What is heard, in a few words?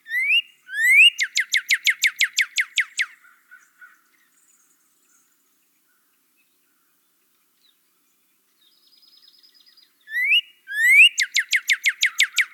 Northern Cardinal Song, NY